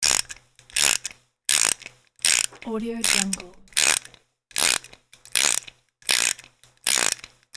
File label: cranking a small ratchet wrench loop